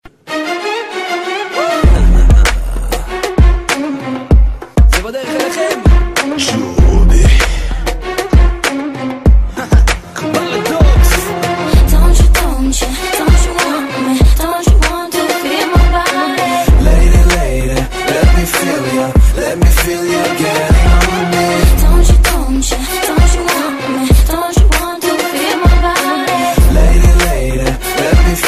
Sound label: arabic style mix, Hip Hop Ringtones